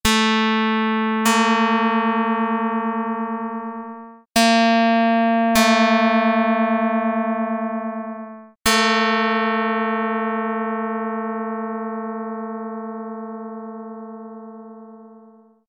pluck; dr220

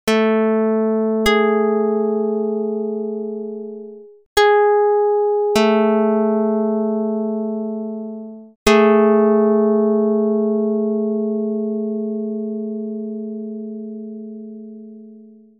pluck; 17/9; dr220

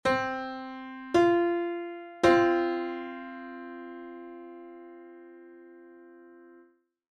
Piano 5edo

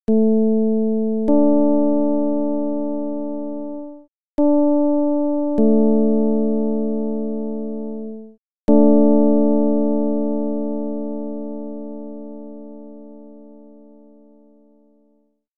csound foscil 220hz

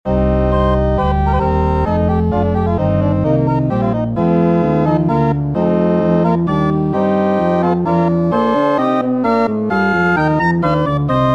Diasem Dorian Example 21edo

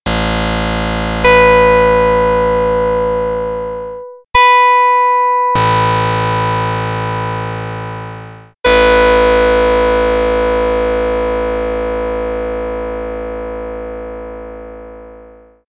pluck; dr55

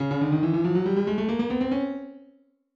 Scale

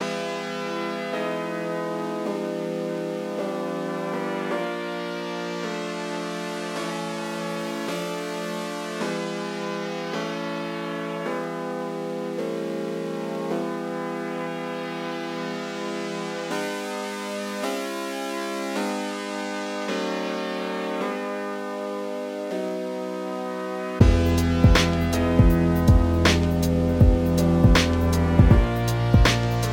19edo Beat Sketch

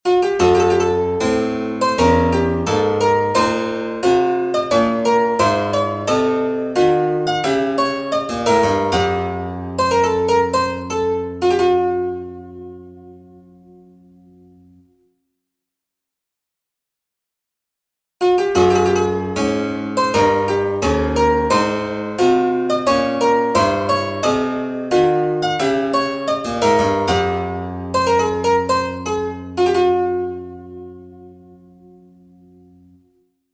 Classical Exercises 21edo; Oneiro

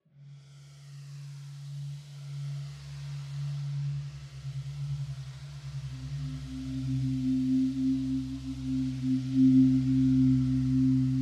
Soundscape; Mode13

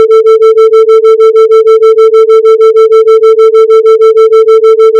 Autogenerated sine 120 cents

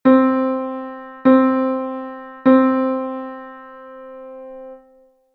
unison

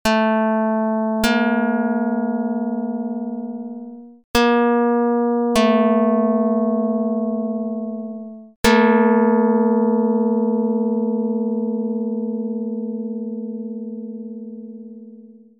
pluck 220; Jid 13/12

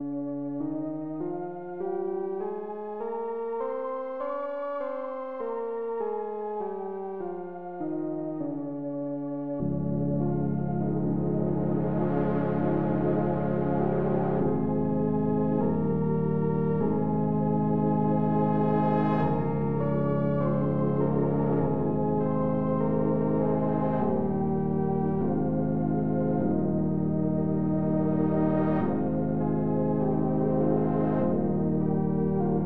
16EDO Mavila7 Studies AntiDorian

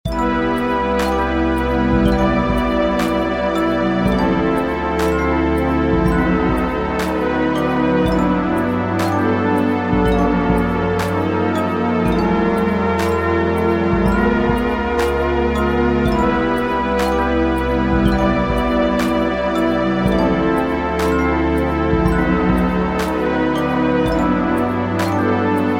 19edo

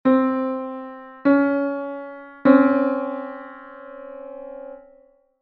neutral second (10-EDO)

